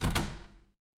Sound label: door open